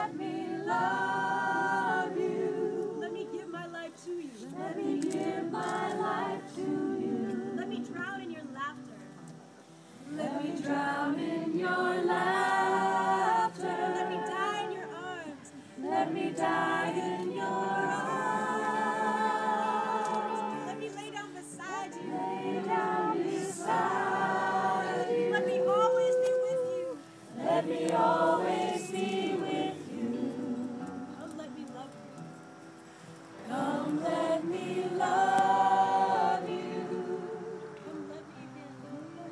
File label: singing at wedding